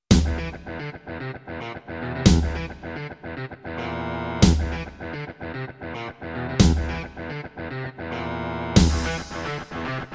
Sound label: BCM DB2133a; Doom